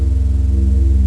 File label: draw; buffsg552